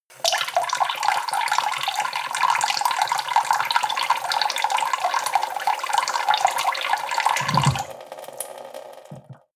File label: sink water pot soft; industrie-wirtschaft; gastronomie